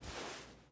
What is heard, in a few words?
ui pickup cloth; Rust